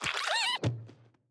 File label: Big slip